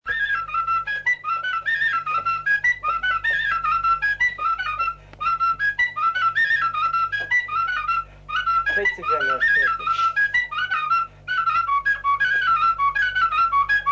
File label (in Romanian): Tiganeasca la fluier; jocuri si cantece batranesti; Vaslui